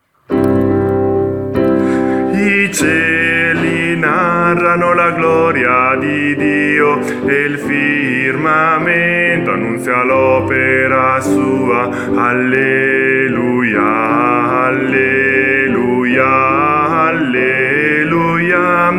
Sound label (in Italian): CONTRALTI